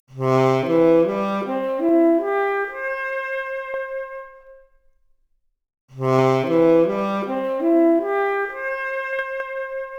Saxophone arp, mp Stereo output noised, 4bit

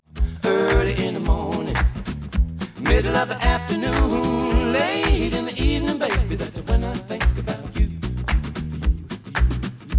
Stereo output noised; 3bit

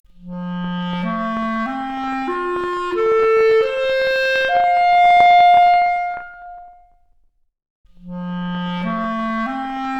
Clarinet arp, Stereo output noised; 4bit

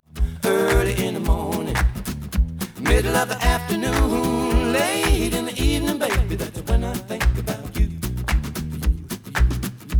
noised; 4bit

